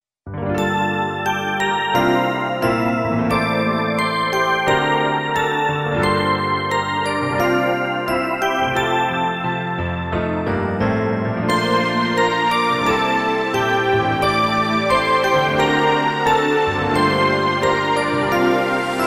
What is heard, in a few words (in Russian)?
ВСТУПЛЕНИЕ фон